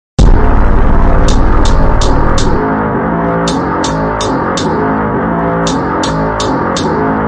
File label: slowed